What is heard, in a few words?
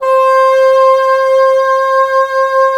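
WND BN, wind bassoon; LA Composer vol. 3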